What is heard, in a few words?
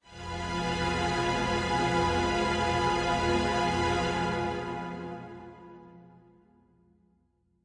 Heavy Strings F; PADS and STRINGS